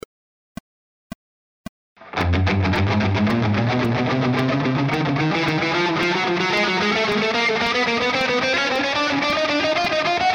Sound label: picking exercises, guitar